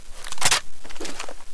ak47 clip out, weapons

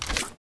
FAL Draw, weapons

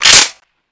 m4a1 bolt release

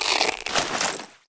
scar draw

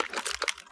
deploy, m82a1; codmod

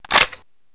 ak47 clip out

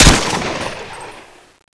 m4a1 unsil, SCAR-H

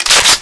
spass pump, weapons spass12

